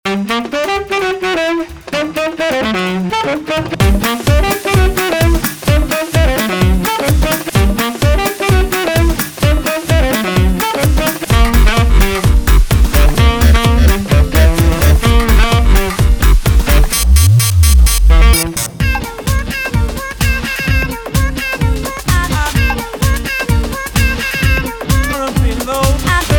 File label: Electro Swingers